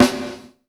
GATE GATED, PROC SNRS, Drumworks; Sampleheads; New York City Drumworks VOL-1

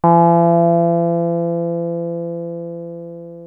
303 D#3, TB 303

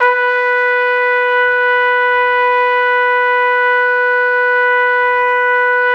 B3, Pop Brass; Best Service ProSamples vol.19; AKAI

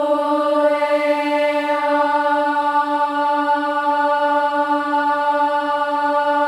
OH EH, Symphony of Voices; Spectrasonics

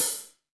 HH, Kits, Rev

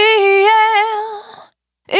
Urban Dry, Single Voice Melodic Loops

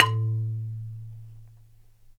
Marimba; Roland LCDP11; Africa VOL-1; Af.Marimbas

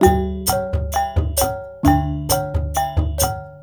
WYANG L, Heart of Asia

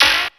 Electric Hat, Ensoniq ASR-X Pro

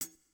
Kit, breakcore Drum Hits

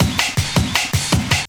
LOOP L, Drum & Bass 160 BPM; Ueberschall; Drum & Bass Flash Trackz

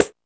PANDERO SLP; FILM KIT; Drums In Your FACE!

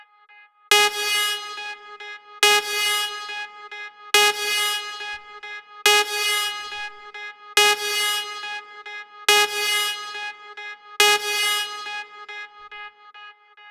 140BPM G#; Vengeance - Essential Clubsounds Vol.4

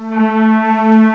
HISTRNG, CMI Factory

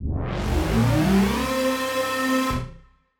Siren-Horn Type Hits, Ramp Down, C; ProfMash; future rave

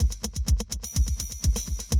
125, Classic Drum Loops

